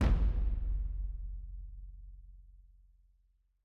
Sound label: music interactive; World of Tanks